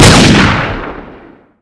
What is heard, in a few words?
Rifle Shoot